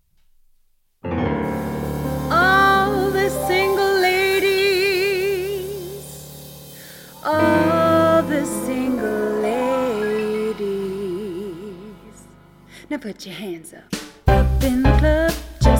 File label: jazz